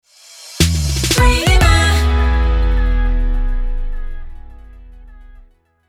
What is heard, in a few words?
Jingle Sting; Rhema